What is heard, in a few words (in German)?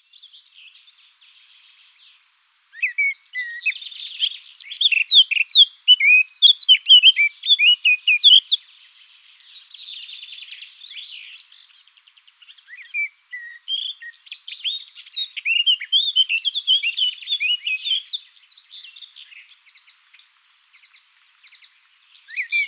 Mönchsgrasmücke Gesang; Wiesloch